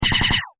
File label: Science Fiction Laser Machine Gun Short Burst; Lasers; SFX Bible 6